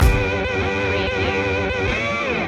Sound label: footstomp swamp blues stinger